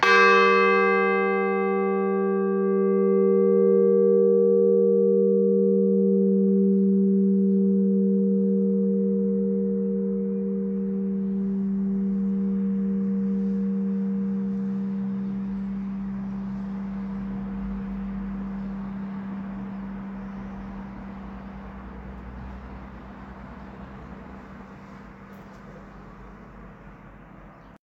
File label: lower beeding eight, bells